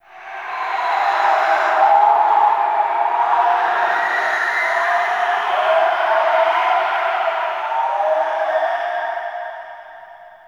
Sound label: Death Wind Gust High; Ambience; One Offs; Red Night